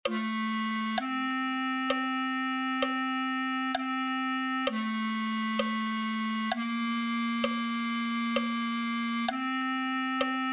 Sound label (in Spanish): clarinet1